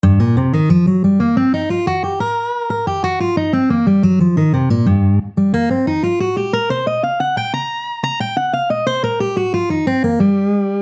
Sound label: c minor blues scale base note octave